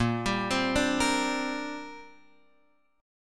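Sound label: A sharp 6th Add 9th chord, Guitar, Open F tuning; arpeggiated; x,5,7,7,5,5; JGuitar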